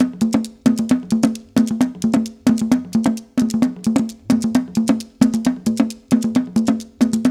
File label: JU JU, African Contemporary